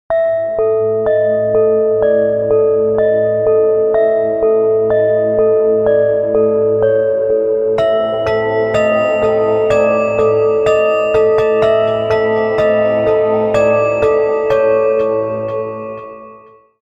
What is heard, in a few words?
spooky melody